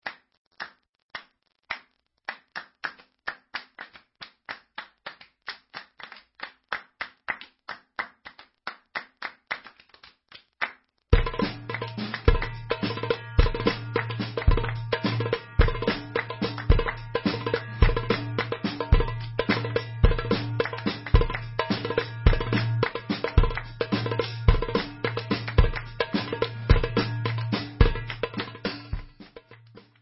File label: Debka, Instrumental